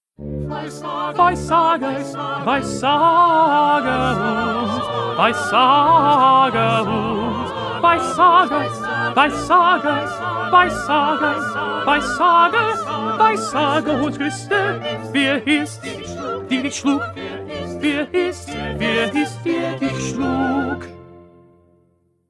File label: 2nd Tenor